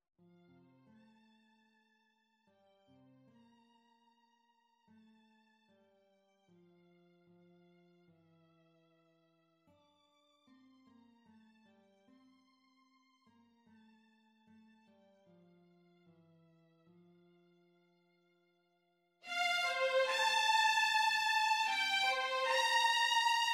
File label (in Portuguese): Violino 2, Dona Nobis Pacem